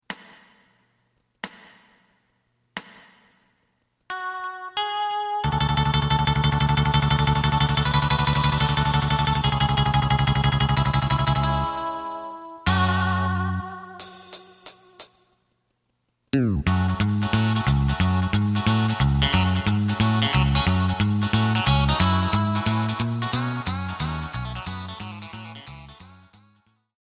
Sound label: drum